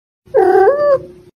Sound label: meow; puff